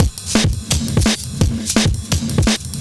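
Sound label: Drum and Bass Beat 170, drumnbass 170bpm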